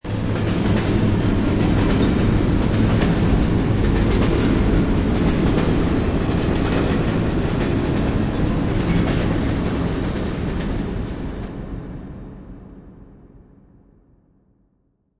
Horror Ghost Train, Ghost train passing by, heavy reverb; Horror - Ghost train passing by, heavy reverb as in a dream world. Rails rattling; Ghost Sound Effects